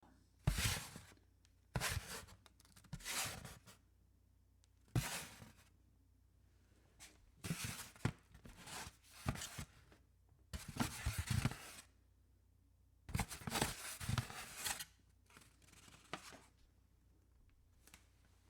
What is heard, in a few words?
ladle food into carton